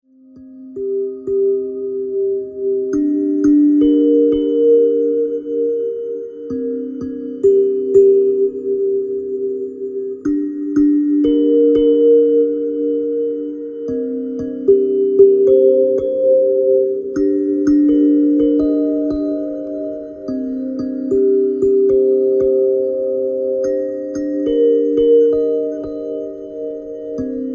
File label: Sounds of my Hands